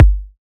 kick G, hip-hop drum kit; 99Sounds x Monosounds; Moon

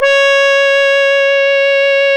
C#, Synclav FM, BRASS; Big Fish Audio

